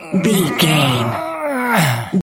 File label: voice male effort push slow